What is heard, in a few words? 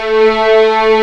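A3 legato, violins; East West; The Ultimate String Collection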